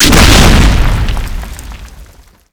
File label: exp stone close; Explosions; Call of Duty Modern Warfare